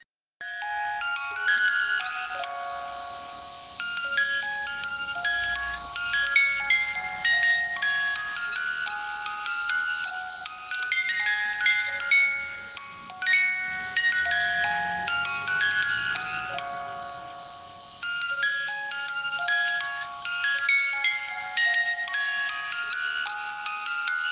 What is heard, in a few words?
brahms lullaby